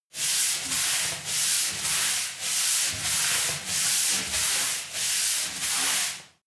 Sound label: broom sweep sway